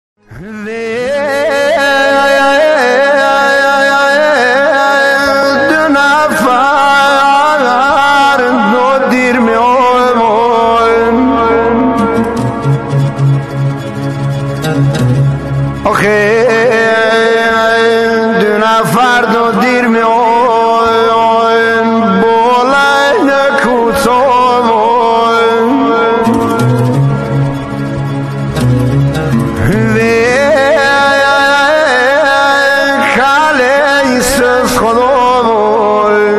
madah

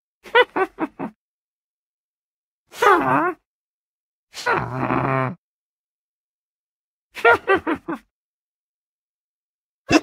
witch minecraft

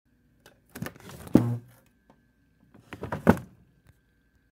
wooden box

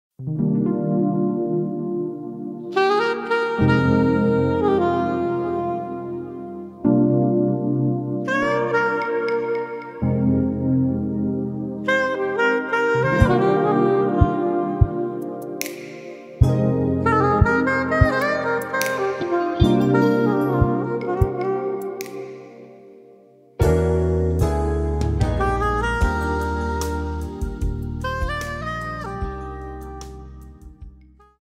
Jazz music, بی کلام

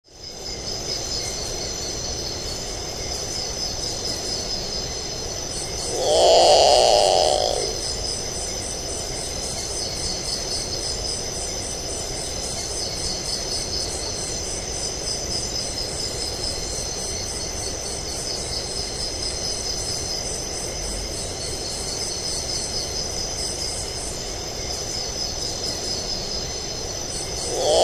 nyctibius

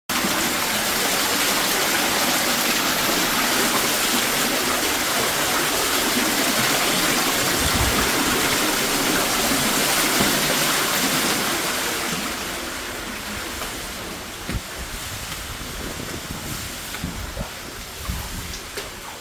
flood

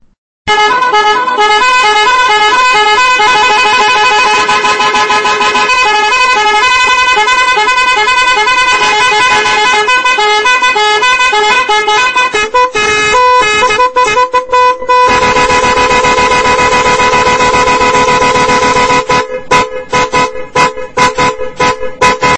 Echo horn

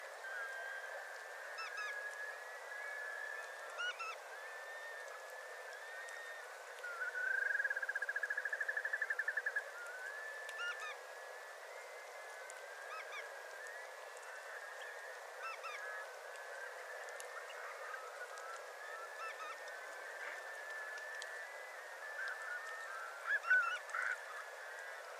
Bar tailed Godwit

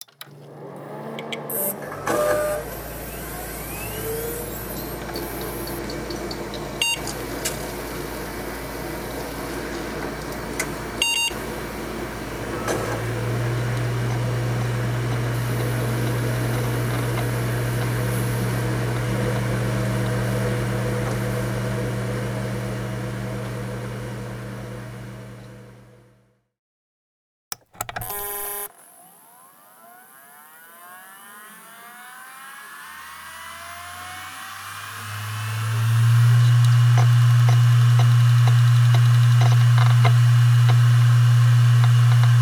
computer fan; فن کامپیوتر

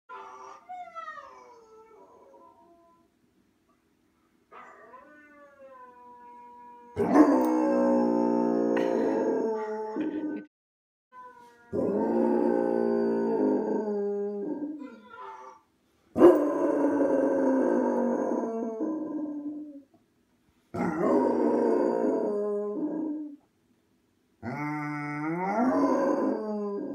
Basset Hound; سگ باست هوند

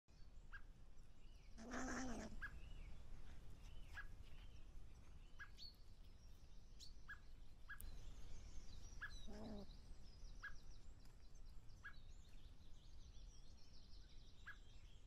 European pine marten; سمور جنگلی

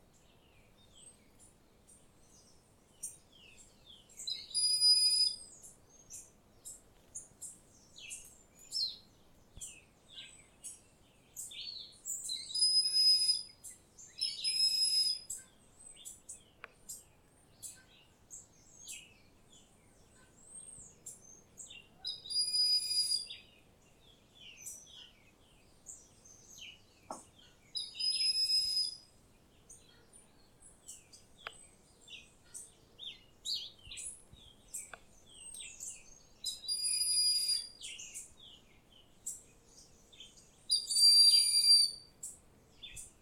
Broad winged Hawk

